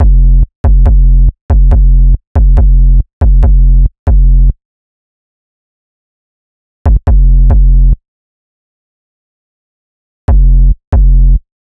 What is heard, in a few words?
Bass Wet; TrapDrive